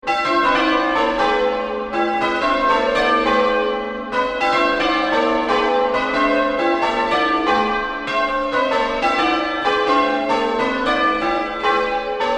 Church Bells Peeling, 16th century double toll at start then single bell tolls; Churches; Tom Hutchings